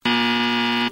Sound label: error